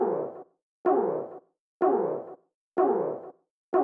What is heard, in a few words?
Synth Long, techhouse